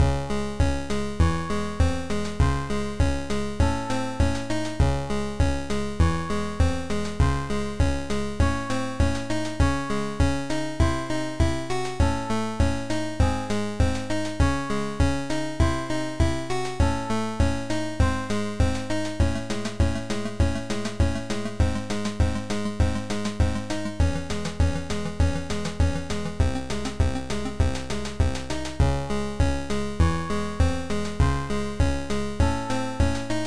Intro Music; Daggerhood

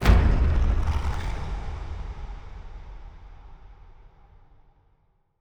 Stingers sting monster

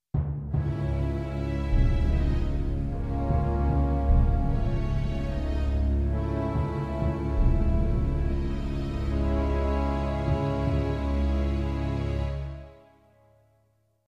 Failure; Korea Forgotten Conflict

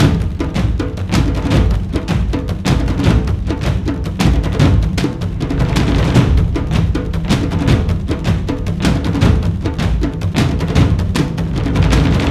Drums

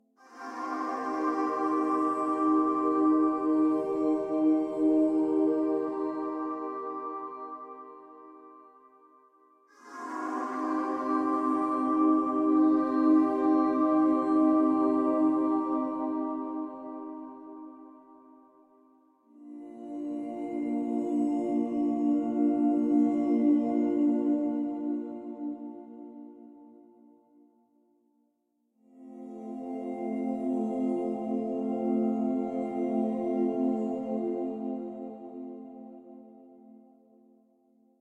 Tension Pad; nova 111; ps vita

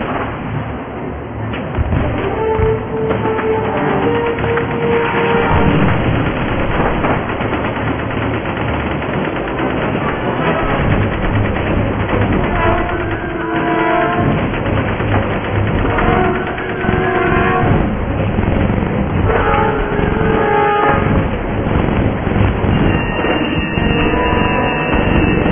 Ambient Music

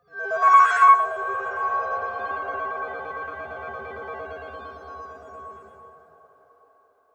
level completion; lowglow

